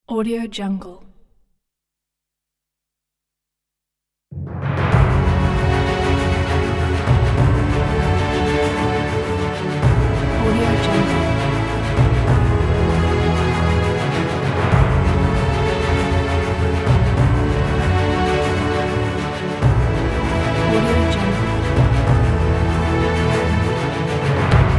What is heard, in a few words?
epic inspirational song kit